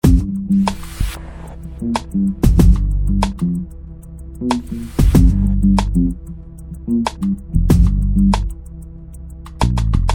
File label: Loop